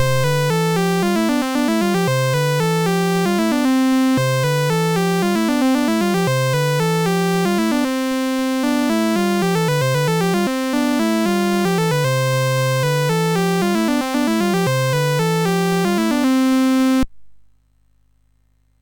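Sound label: Number Tumbler, MOS 6581 SID chip music, games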